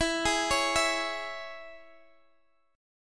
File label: Ab Suspended 4th Sharp 5th